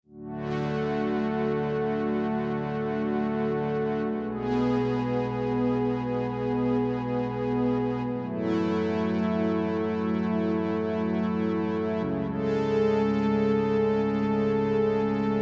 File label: Dreampad